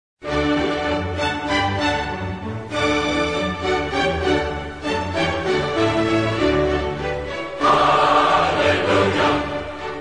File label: Hallelujah Chorus (orchestra, choir)